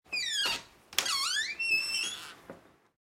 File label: Old Wood Door